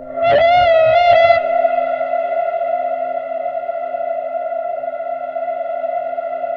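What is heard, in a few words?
FUZZ TUBES, AMBIENT FUZZ; Spectrasonic Distorted Reality 2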